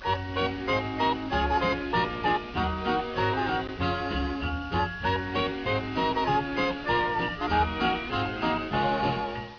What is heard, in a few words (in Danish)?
julebeat